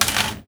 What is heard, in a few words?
Foley